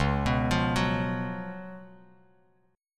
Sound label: Db 11th Db, Bass Standard; arpeggiated; JGuitar